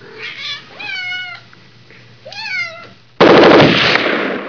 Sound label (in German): Katze tod